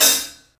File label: Hi Hat Closed Death Metal